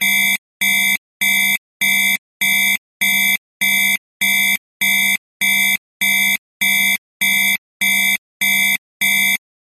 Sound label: science fiction alarm loop